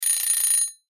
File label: alarm